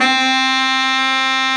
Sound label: BARITON SAX